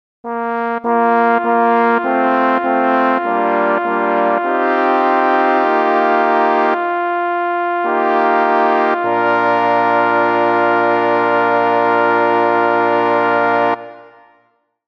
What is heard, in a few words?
All Parts, barbershop tags